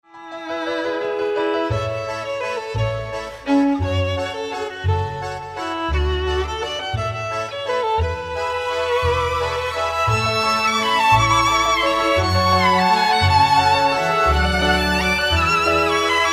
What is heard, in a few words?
Waltz; Merry-Go-Round Waltz